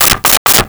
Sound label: Nail Hammer into Wood; Tools; 1001 Sound Effects